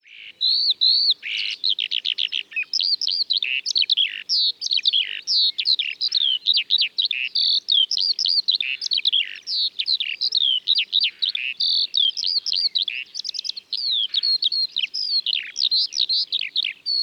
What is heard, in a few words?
Eurasian Skylark Alauda arvensis; Ladakhi name:Chipa cha-chilling